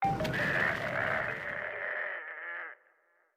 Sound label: Mech Death; StyxMech